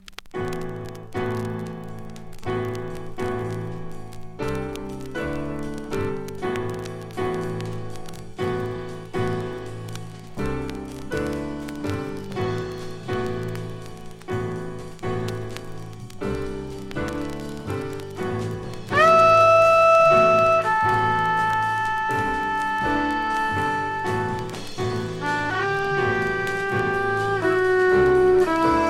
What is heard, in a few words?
openstrings; インプロ・バイオリン’69年作